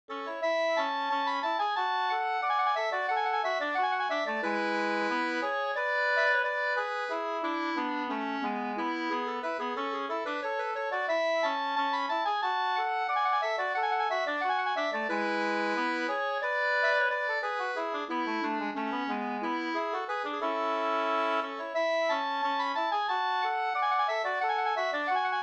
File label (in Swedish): ganglat, 2st; Trettondagsmarschen